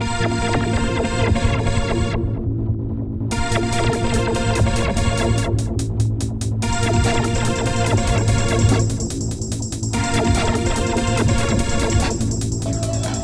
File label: Hardcore Breakbeat, 145 Bbmin; Big Fish Audio; Hardcore Breakbeat Action; Suspicious